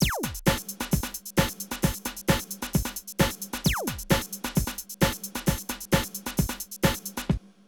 Drum loops, Rave & Hardcore

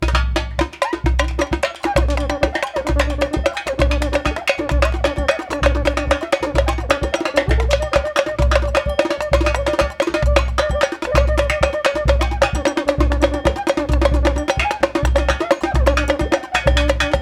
130 bpm, Rio batucada

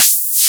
scifi, SCIFI & SWEEPS; Techno Trance; FX & ATMO; Techno Trance Essentials

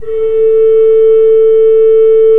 KOPPELFLUTE A3, Cathedral Organ; Propeller Island

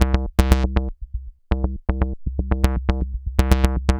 Synth

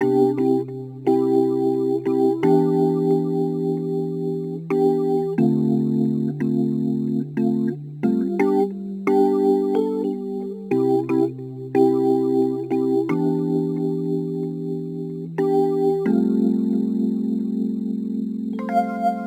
Rhodes Attacks; Ueberschall

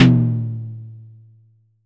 Drum X Tom, Pearl Drum-X

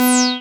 SYN TBM C5, MC202; TB303+MC202; Sound & Vision - Gigapack I CD 2

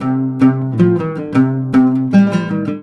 LUTH E, Arabian Traditions, LUTH RIFF; USB Soundscan vol.43; AKAI

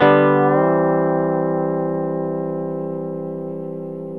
Pedal Slides, G TO C

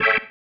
Stab, Organ, One Shots

